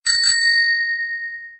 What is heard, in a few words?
Bicycle Ring ring